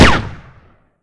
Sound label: sniper rifle fire; gesource; weapons